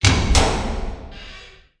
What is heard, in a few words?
Door Open